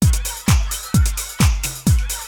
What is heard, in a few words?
house, loops